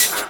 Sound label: HH, HIHAT HIP, Dance Mega Drums; 90 s Sample CDs; Best Service